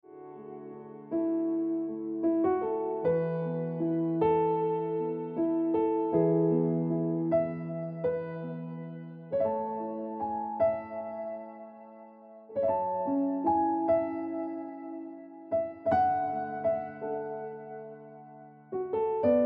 Happiness Of Waiting Ringtone Piano Music

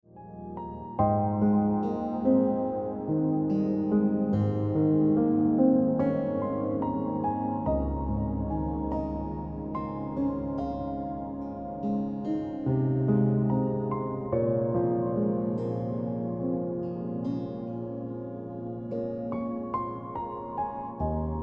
Piano Music

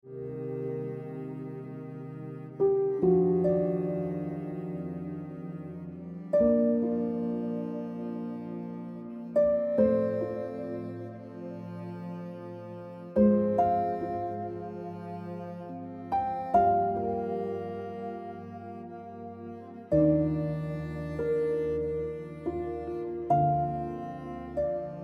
Jade Ringtone Piano Music

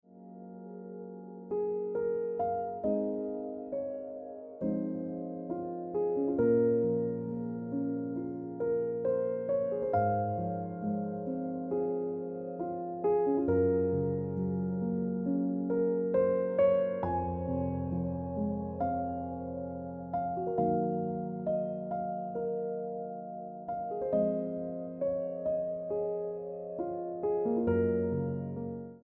Ringtone Piano Music

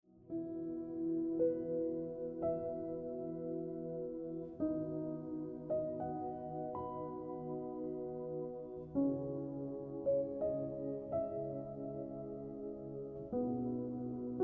Looking Back Ringtone Piano Music

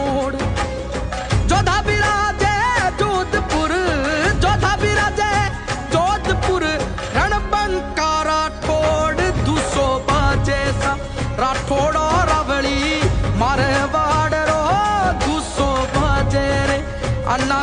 Marwadi Ringtone

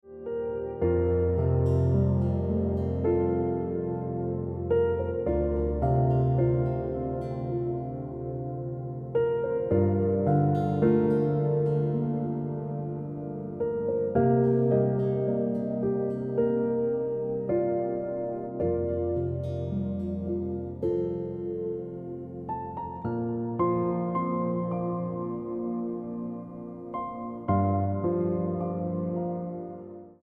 Ringtone

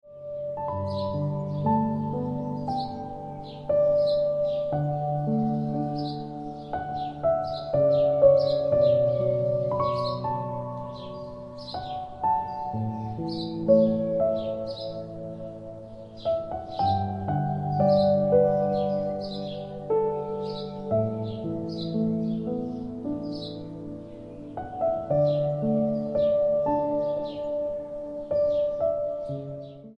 Ringtone Piano Music

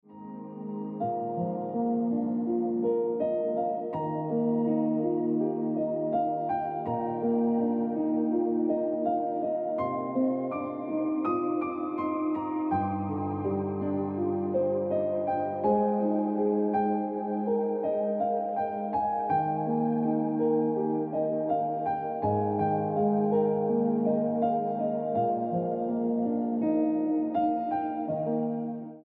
Ringtone Piano Music

